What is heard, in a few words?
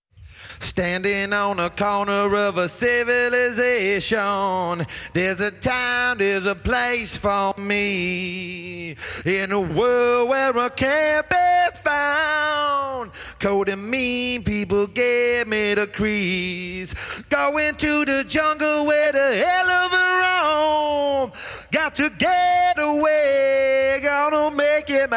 vocals